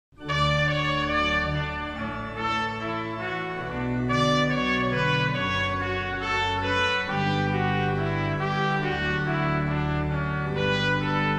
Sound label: CANON IN D TRUMPET VOLUNTARY